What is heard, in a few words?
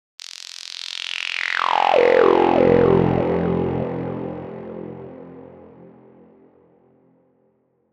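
strange impact sound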